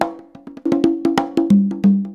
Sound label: 90 CONGAS03; USB Soundscan vol.36; Percussion Loops; AKAI